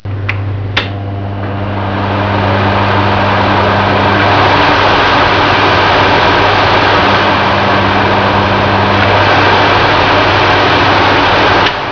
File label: MOTOR